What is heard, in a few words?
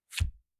Belt, Clothes